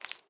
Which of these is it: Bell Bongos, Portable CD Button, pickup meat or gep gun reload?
pickup meat